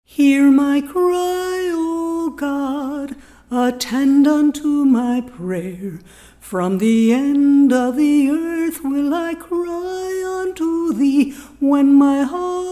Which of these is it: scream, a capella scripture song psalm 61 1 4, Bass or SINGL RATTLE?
a capella scripture song psalm 61 1 4